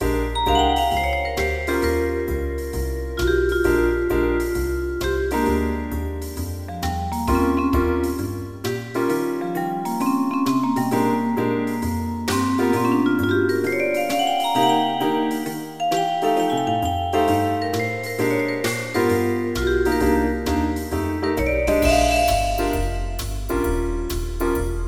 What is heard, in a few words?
blues; wedding